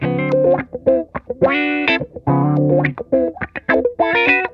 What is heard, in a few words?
Funk Guitar; Zero G - Funk Guitar